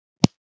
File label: click